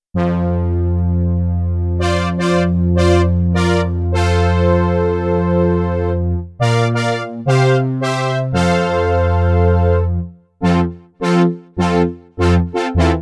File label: Classic Brass, Korg Radias-R